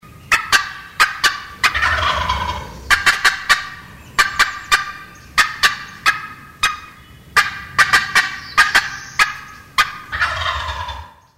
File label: cutt turkey call sound